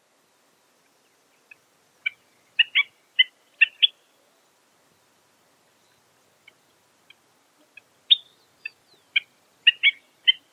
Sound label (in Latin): Pseudocolopteryx flaviventris; Piojito amarillo